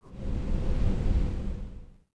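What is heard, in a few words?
train platform wind gust; Star Wars Obi Wan; XBOX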